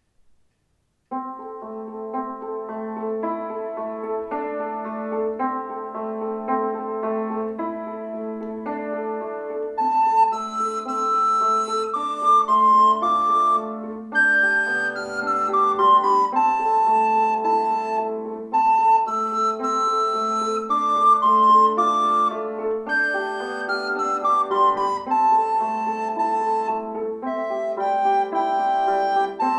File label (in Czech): flétna + cimbál; Hush You Bye; Flautoškola 2 – METODICKÉ NAHRÁVKY a DOPROVODY